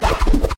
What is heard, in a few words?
auto attack